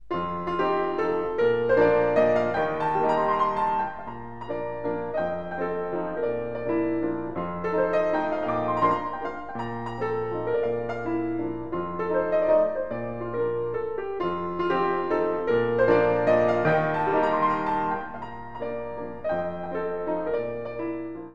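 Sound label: Mazurka in Bb major, Piano; F.Chopin; Chopin Mazurkas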